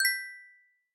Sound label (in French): Mélodie, Sonneries